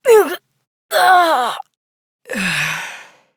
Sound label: Death, Adult; New War; Operator